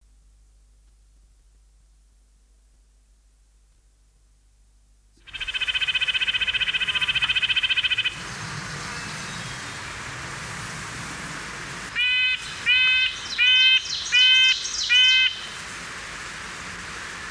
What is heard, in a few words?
red breasted nuthatch, songbirds